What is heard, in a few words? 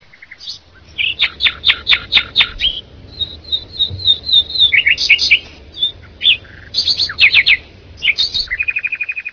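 nightingale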